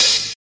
Dry Open Hat D# Key, Royality free open high-hat sample tuned to the D# note. Loudest frequency: 5582Hz